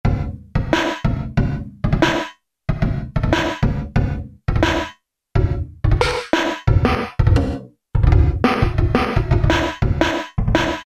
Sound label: Drums Set, Roland PN-D50-02